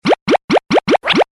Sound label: pop; daily prize